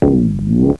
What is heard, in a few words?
boing